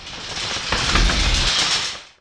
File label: spear stop